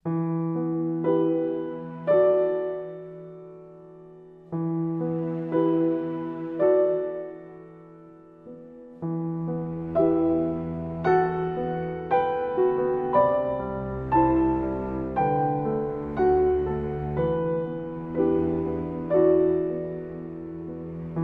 With Strings

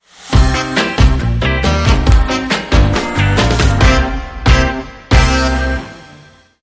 60s Soul Fusion, Sting

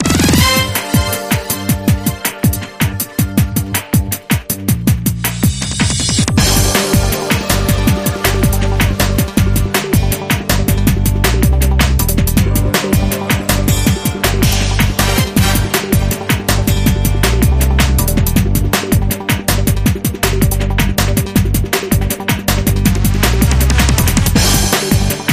Promo Bed

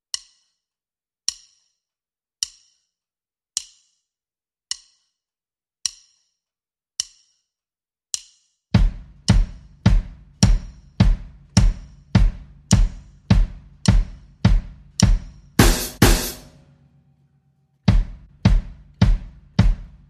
Almost Funky, Drums Only

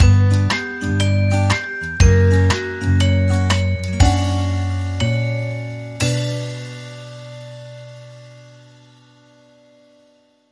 Crafty Changes, Sting Downbeat

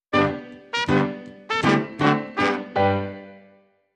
Sting No Clarinet

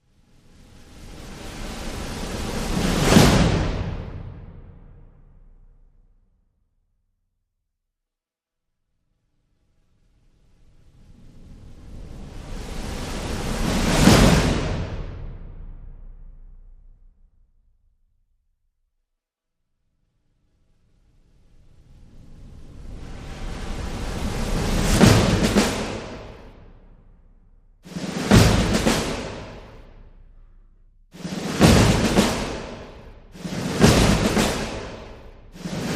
Drums Militia

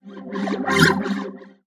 Garbled Swirl

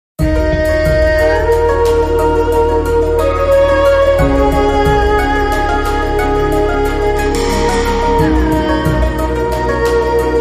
Chinese Chime, Short

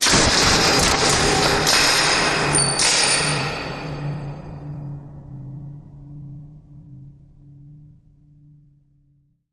Crashy Rattle Impact

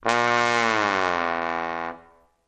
Trombone Low Gliss Down

